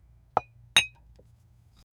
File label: subtle clinking of glass